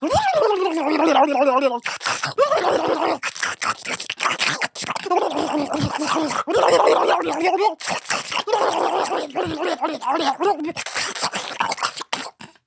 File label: aggressive loop; Fluke Mother